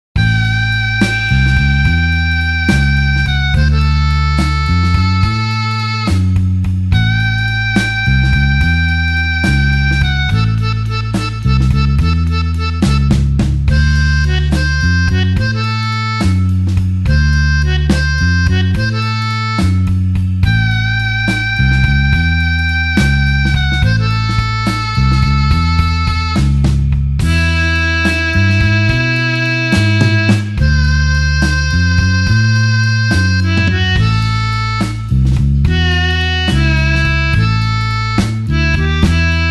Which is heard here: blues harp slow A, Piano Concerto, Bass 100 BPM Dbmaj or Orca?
blues harp slow A